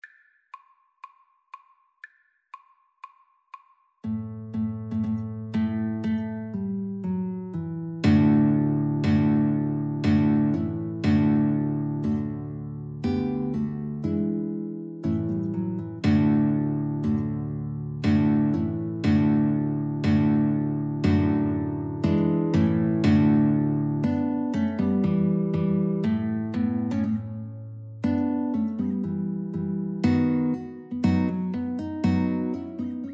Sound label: wedding march, Violin Guitar Duet